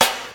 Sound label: clicky steel snare drum sound a key; snare-drum-samples